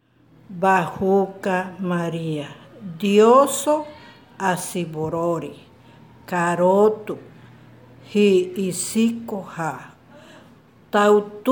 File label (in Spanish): ave maria en warao lento